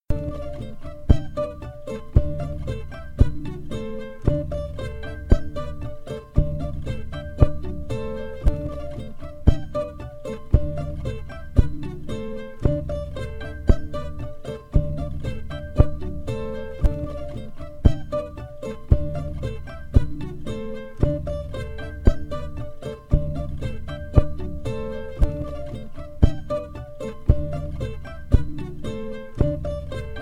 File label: loop